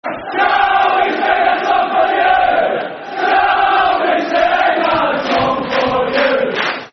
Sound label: Shall We Sing A Song For You, Football Chants; Rangers GRFC